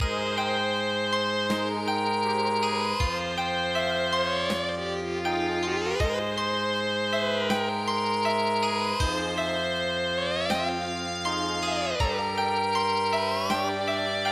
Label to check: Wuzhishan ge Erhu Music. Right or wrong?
Right